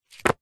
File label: foley raffle ticket book wads set down; zapsplat